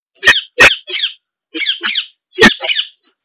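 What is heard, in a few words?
parrot voice; Golos popugaia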